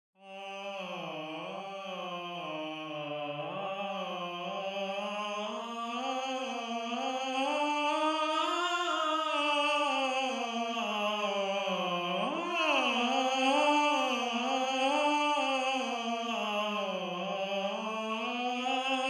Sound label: Tenor, counterpoint